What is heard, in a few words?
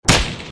Skorpion